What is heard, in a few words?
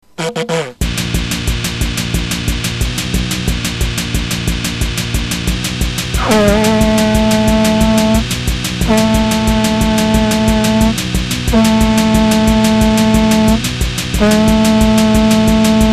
Vuvuzela; paros